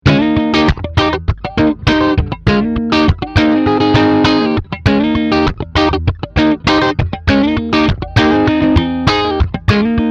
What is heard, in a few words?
Lite Crunch Both Pickups